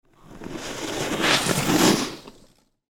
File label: snowboard sliding